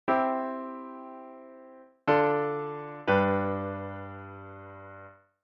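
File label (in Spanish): Semicadencia Ii a V, C; auditivo de cadencias autenticas plagales rotas y semicadencias; Identificar la cadencia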